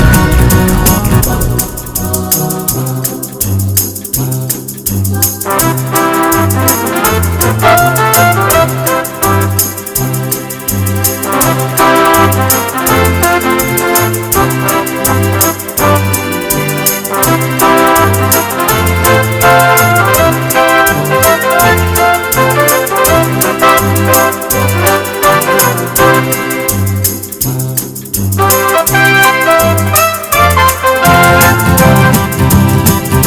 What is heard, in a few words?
Hot Jazz